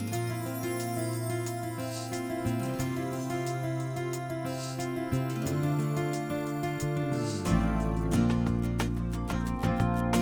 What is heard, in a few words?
Date Music